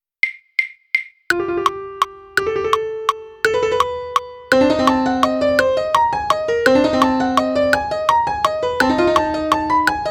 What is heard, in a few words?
butterfly piano click